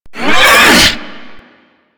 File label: Hammer Boss Exertion, Vocals; Warframe